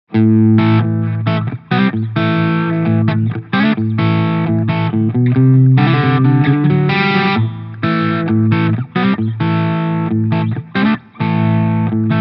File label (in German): MicroCAB 4 x 12 angezerrt; Harley Benton MiniStomp